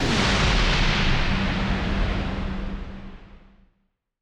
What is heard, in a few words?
soul disappear; enma